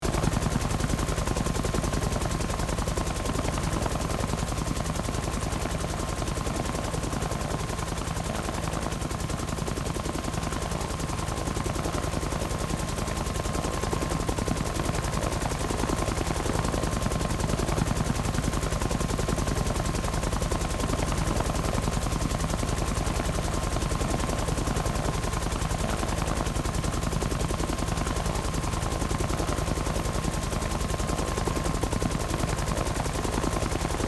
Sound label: Helicopter flying forward movement engine loopable